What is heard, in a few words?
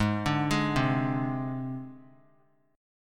G sharp Major Flat 5th; arpeggiated; 20,21,23,22; 3C,3F,3A,3D